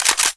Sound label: mp5 slideback; weapons; mp5navy; Left 4 Dead 2